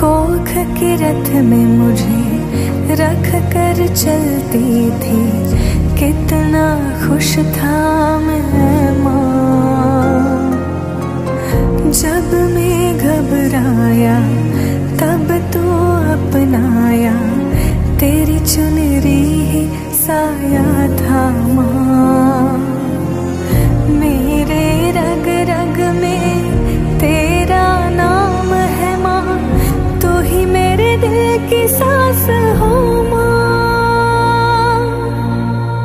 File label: Movie Song Ringtone; Kgf